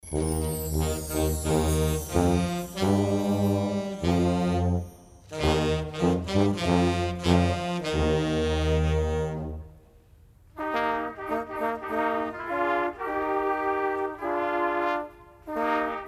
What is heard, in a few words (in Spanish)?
popurri infantil